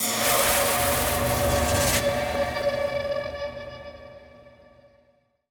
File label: Spawn